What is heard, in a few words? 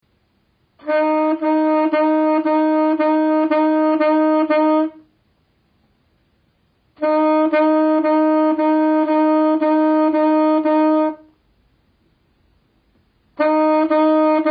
Tonguing